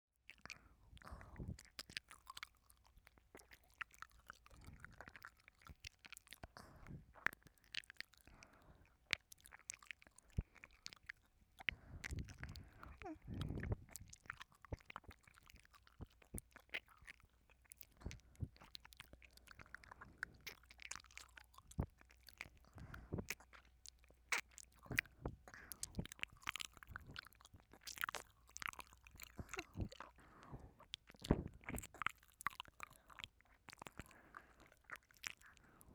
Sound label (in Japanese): ねっとり両耳舐め, ASMR